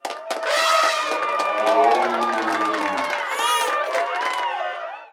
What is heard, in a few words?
croud; CosmicRage